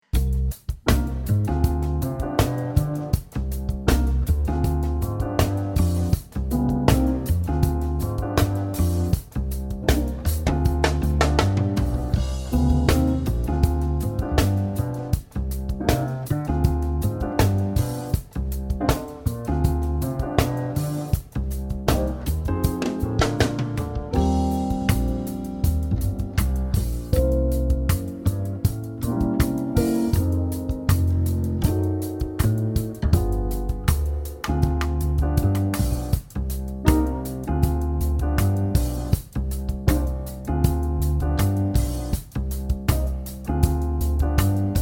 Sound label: funky key of Eb; note 6 C; jazz workout